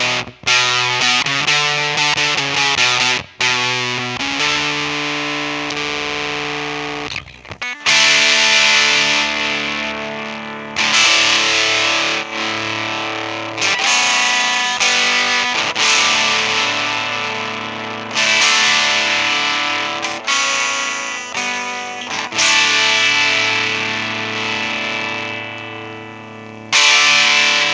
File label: maestro fuzz tone fz 1